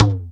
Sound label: Roland JD800 Internal Drums